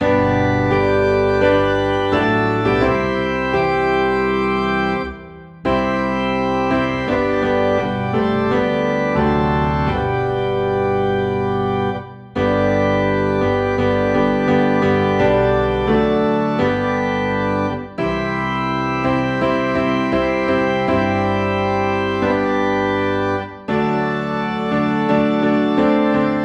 Piano and Organ Low in G

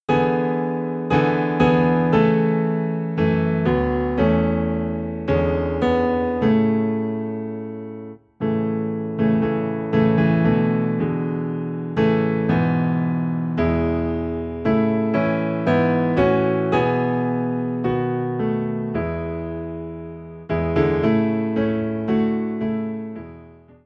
Piano - Low in A

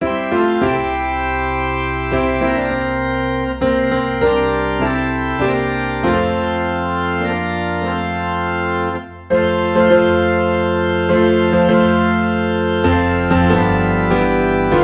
Piano and Organ in F

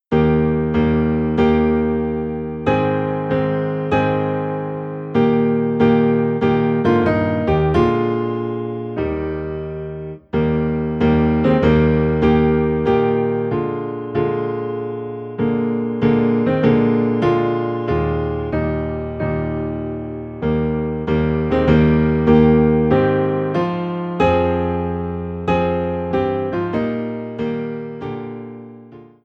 Piano Low in E Flat

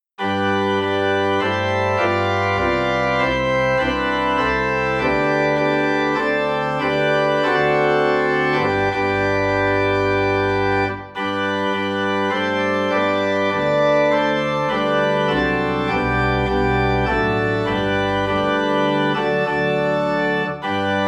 Organ in G; Joyful Joyful We Adore Thee

